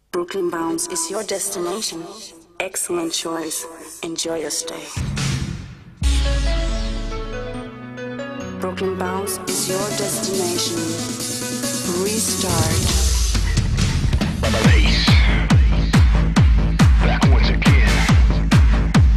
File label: bass beats melody